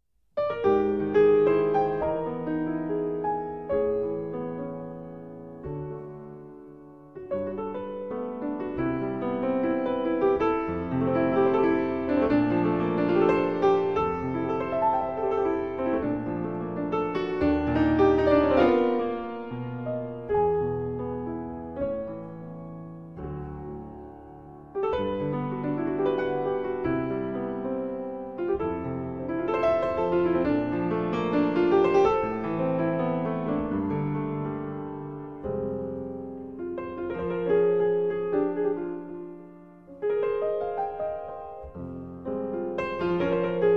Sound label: waltz